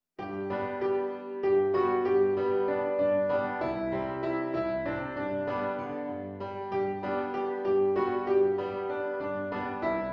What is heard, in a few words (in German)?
Klaviersatz